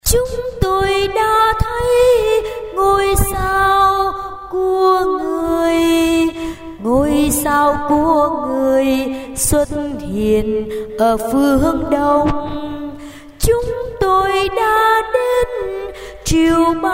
alto

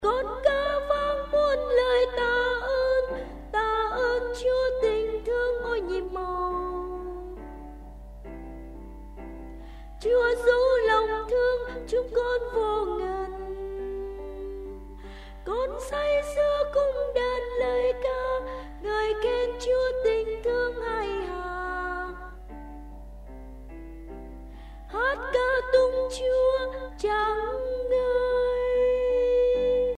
tenor basso

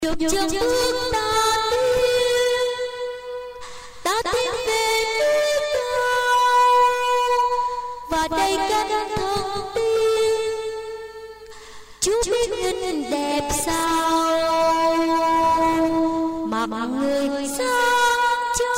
tenor basso

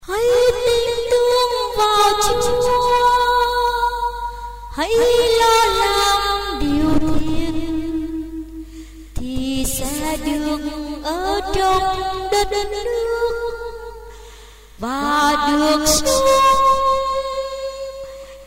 solo

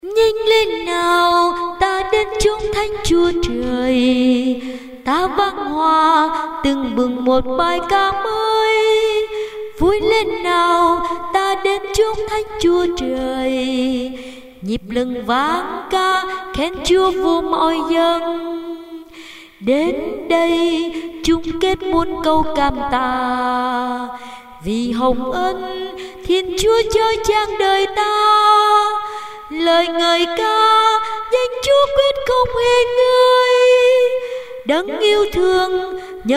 sop alto

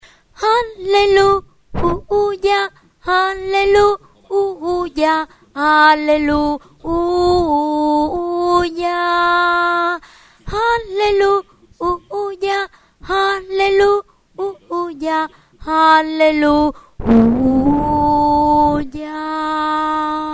alto

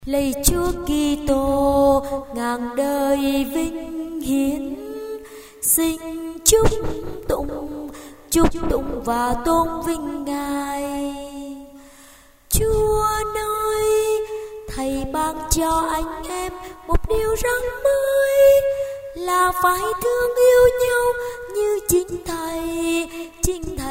alto basso

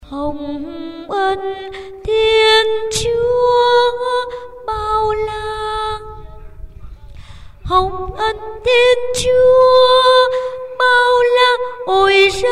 alto

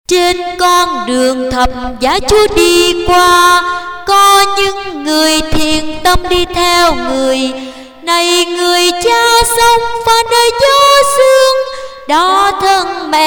tenor basso

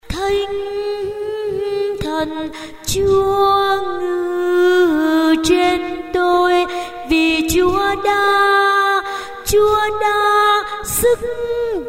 alto

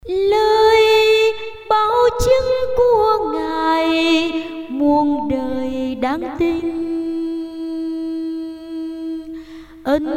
tenor

